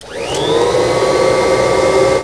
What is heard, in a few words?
Vacuum On; 1997 MMX LAN PACK (win 95); Army Men